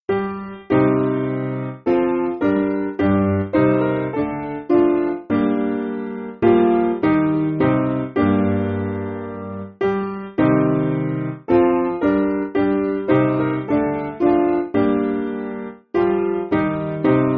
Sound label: When Grief Is Raw Piano